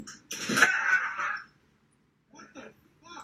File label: ahhhhh